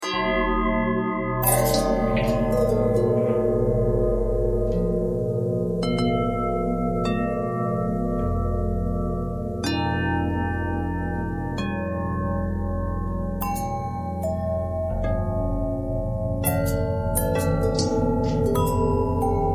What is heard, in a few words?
longbell bell bendtwist